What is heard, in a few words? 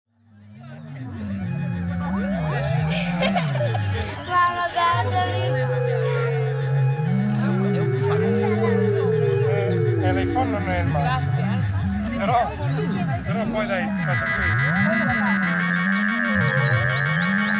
I have never heard a theremin before